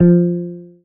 marimba guitar; ゆめ2っき

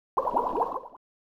Bubble

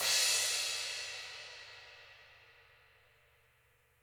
marimba drum; ゆめ2っき

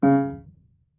marimba piano; ゆめ2っき

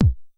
marimba; ゆめ2っき

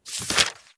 paper

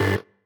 marimba; ゆめ2っき

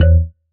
marimba raincoat; ゆめ2っき

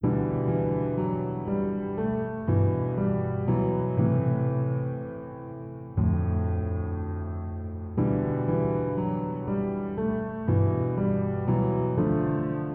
piano; ゆめ2っき

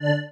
marimba spacesuit; ゆめ2っき

